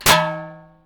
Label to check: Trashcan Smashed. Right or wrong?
Right